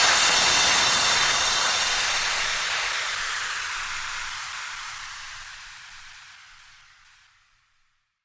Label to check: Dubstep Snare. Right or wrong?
Wrong